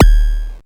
BD, Mutable Instruments Anushri

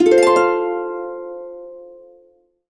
HCFMAJTRI, Complete Harps; USB Soundscan vol.62; AKAI

